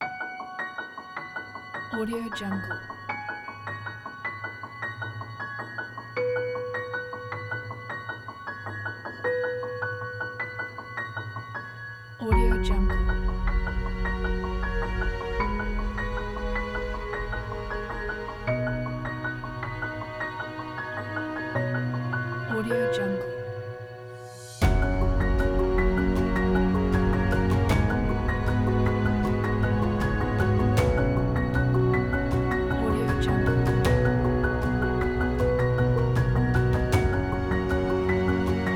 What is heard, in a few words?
an inspiring piano